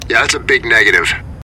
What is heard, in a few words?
Big negative